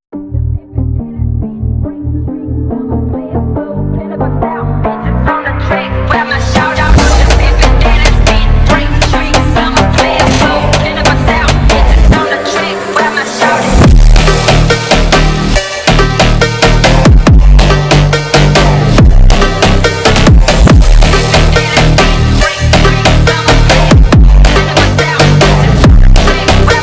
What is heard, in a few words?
Brazilian Phonk remix